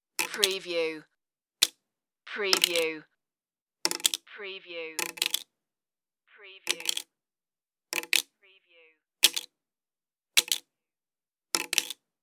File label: drop credit card